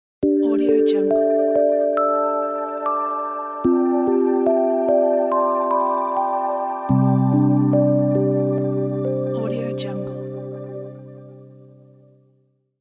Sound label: inspiring tech ident